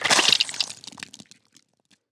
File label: game blood pop slide; mixkit